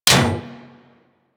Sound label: Bullet Impact Generic Enemy Shield; Surface